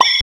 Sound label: combobreak; how the sky was